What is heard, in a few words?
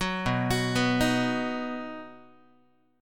B Major B, Guitar; B arpeggiated; 4A,4E,5A,5D,5G#,6C#; chord shape; JGuitar